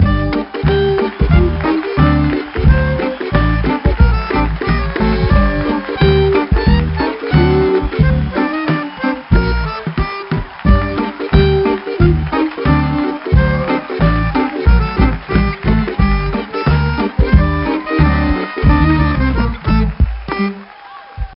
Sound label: Win; Max and the Magic Marker; nds